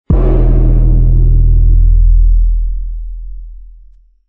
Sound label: cave, ambient; minecraft